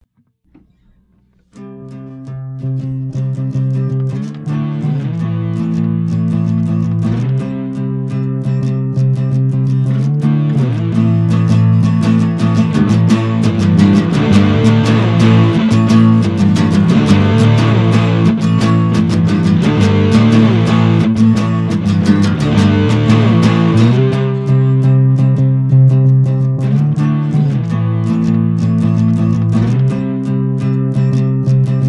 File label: Guitar Loop